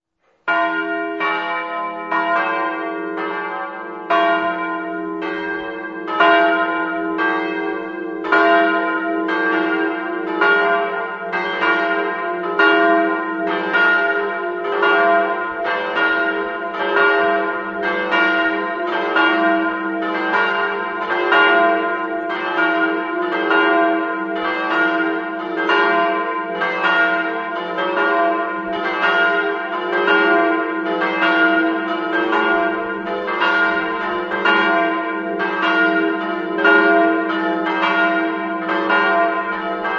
tapogliano, campane

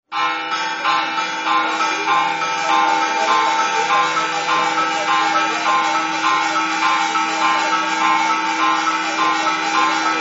rozinca, campane

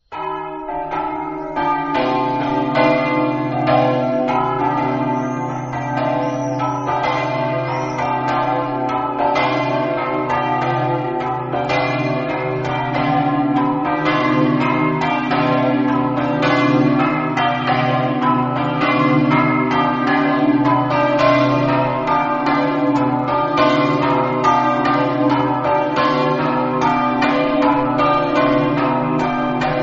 torreano, campane

